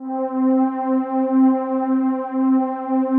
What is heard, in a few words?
PAD, ATMO PADS; Best Service; XX Large